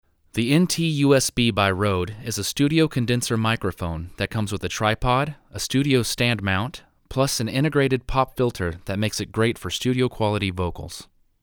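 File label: Vocal, Rode NT-USB Cardioid; usb mic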